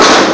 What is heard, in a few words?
metal clang; Amiga Soundtracker Sample Packs